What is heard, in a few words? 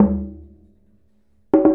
Djembe